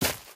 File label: grass, human step